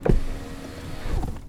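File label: mercedes amg gtr onboard window close interior; ambeo; Sonniss GDC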